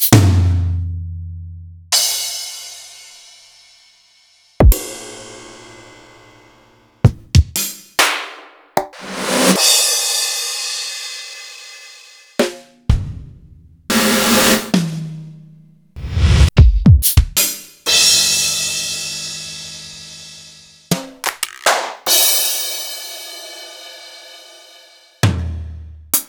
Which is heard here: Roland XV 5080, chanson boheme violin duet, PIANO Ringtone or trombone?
Roland XV 5080